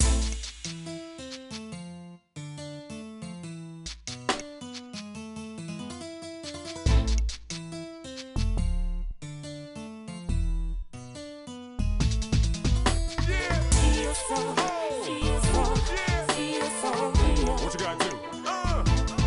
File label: Hip Hop